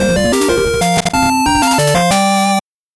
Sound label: 8bit jingle fast